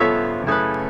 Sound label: keys